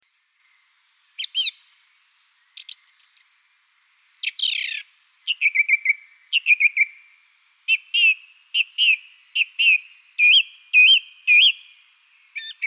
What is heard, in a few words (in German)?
Singdrossel Gesang; Wiesloch